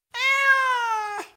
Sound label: meow